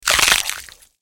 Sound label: bone crack